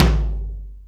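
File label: Tom Lo Floor Studio, Alesis D4 drum machine; 300 Drum Machines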